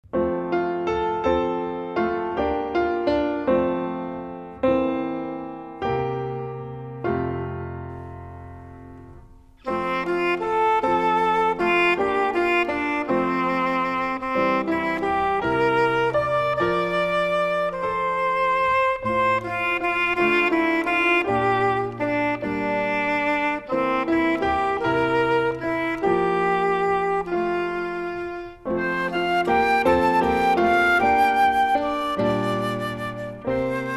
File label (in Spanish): musica